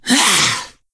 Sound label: Attack; Ionar